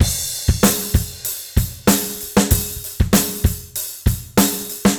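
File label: 96 bpm, beat loops; Zero-G; Monster Beats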